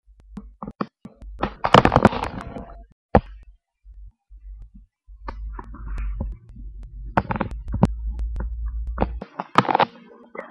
fart

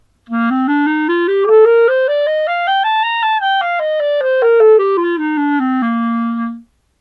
Bb major scale

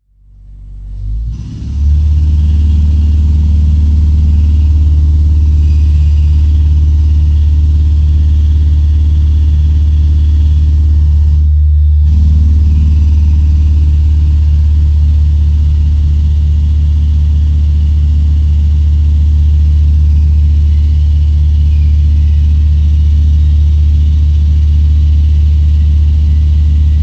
the peaceful sounds of my lounge this morning

